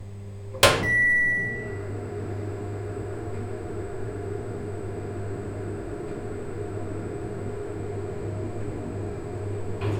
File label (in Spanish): un brillo metlico sutil a, appliances